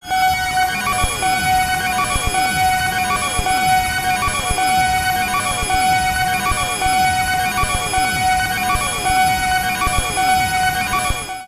base siren, battle

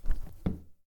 chair sit

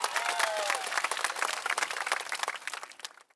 people performance cheer

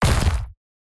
blow, battle

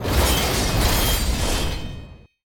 machine deformation

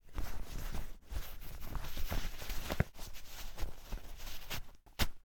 glove

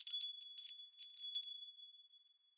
bell

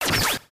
shot struck

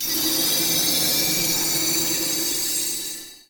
magic circle

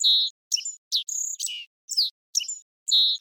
emberiza spodocephala cry

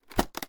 attache case close

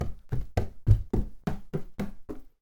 dash staircase home climb

